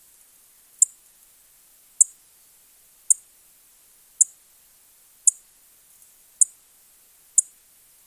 Clay-colored Sparrow Spizella pallida

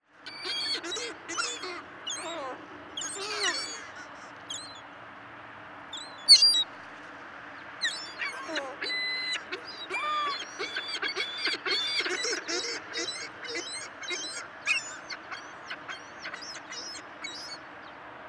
Ring-billed Gull, Larus delawarensis; gulls terns

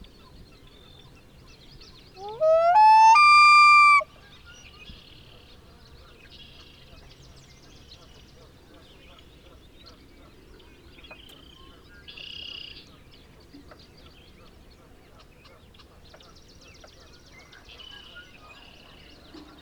Common Loon Gavia immer; waterfowl

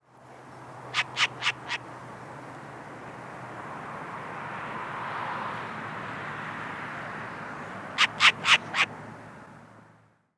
Short-eared Owl, Asio flammeus; owls

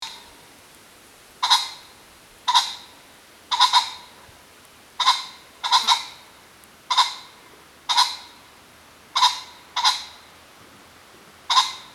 Ring-necked Pheasant, Phasianus colchicus; gamebirds

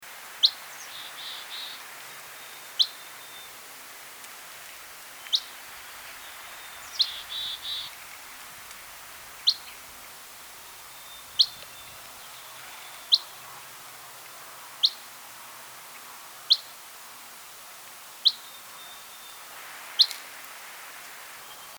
Dusky Flycatcher, Empidonax oberholseri; flycatchers

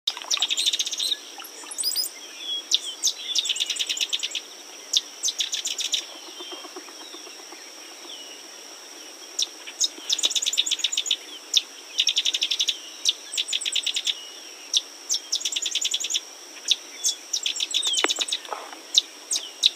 Sedge Wren, Cistothorus stellaris

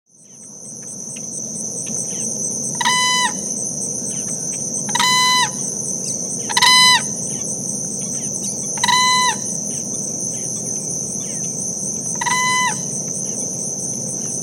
Whooping Crane, Grus americana; wading largewater

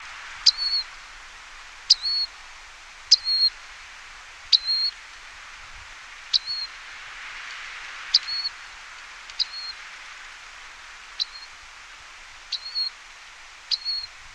Rock Wren, Salpinctes obsoletus obsoletus

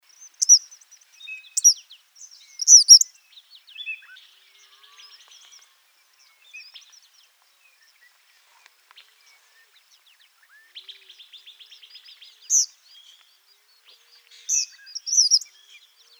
Eastern Kingbird, Tyrannus tyrannus; flycatchers

